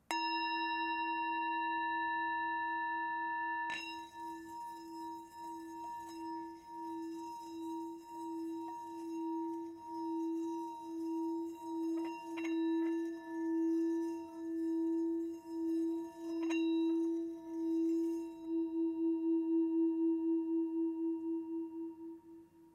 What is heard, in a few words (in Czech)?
tibetska misa